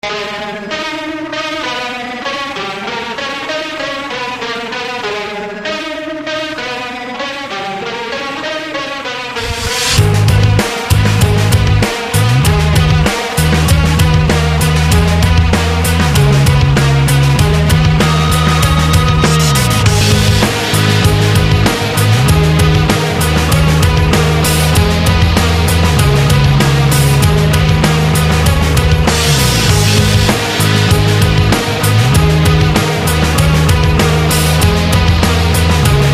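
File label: cool music without words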